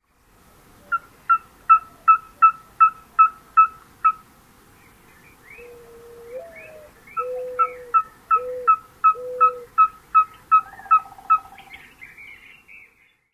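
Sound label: FERRUGINOUS PYGMY-OWL tooting song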